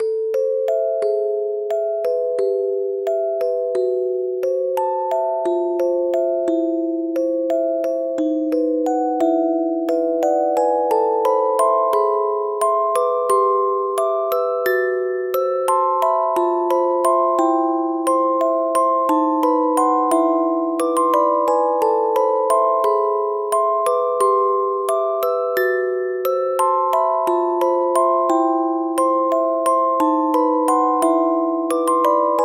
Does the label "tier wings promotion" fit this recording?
No